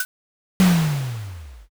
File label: grenade